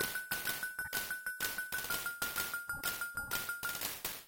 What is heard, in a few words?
Game Over; Steel Empire; Genesis